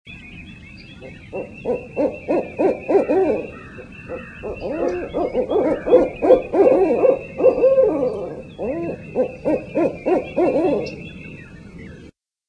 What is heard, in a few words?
turkey owl hooting